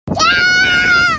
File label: yaaaa